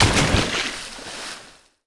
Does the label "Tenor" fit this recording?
No